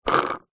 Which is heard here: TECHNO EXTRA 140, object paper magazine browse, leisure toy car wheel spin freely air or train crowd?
leisure toy car wheel spin freely air